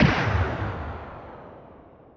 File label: boms